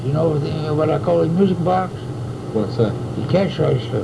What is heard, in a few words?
musicbox